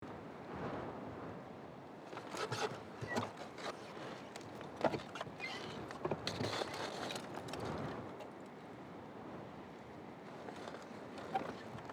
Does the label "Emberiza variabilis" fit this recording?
No